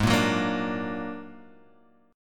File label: A sharp Augmented 7th G sharp, guitar